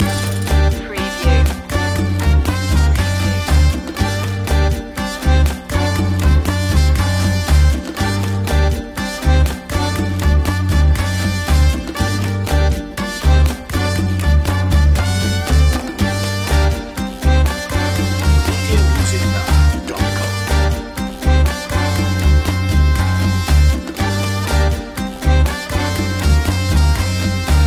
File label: cuban heat loop long